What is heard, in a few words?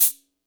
kit, Yamaha Motif-ES8